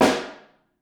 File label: Snare Room